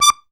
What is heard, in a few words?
ZUPAN STR, AKKORDEON; Best Service; Alpine Volksmusik